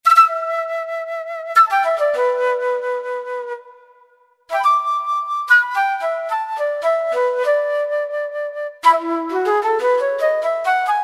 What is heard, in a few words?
Flute, Yamaha PSR-3000; Factory Voices; Flute & Woodwind